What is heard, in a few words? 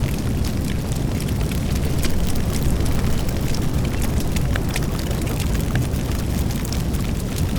church fire